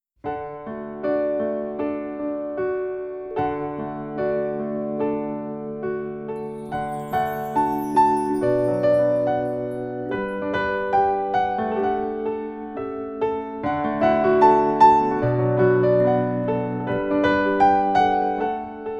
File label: Babys First Melody